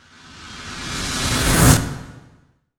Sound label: IMPACT RUSH, Cinematic Impact; M-Audio Pro Sessions; Liquid Cinema